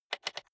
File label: rotary control short turn quick soft